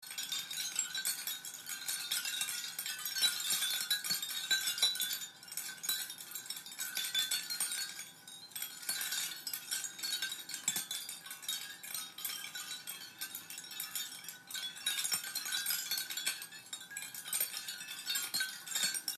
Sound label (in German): Muschelmobile